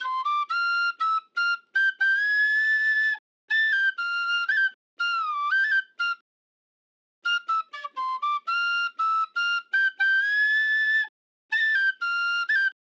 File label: Dancehall Soprano Brass Flute Cmaj 120 BPM